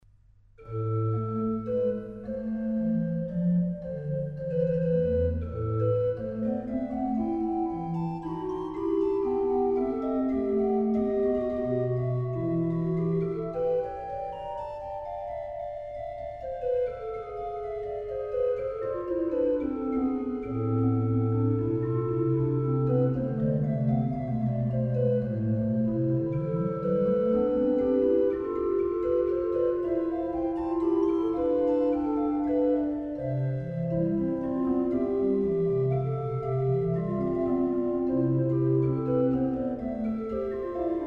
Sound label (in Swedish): kororgel; Sinfonia nr 13 a-moll; Alem